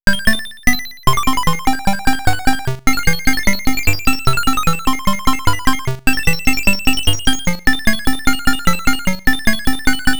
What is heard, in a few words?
atari in game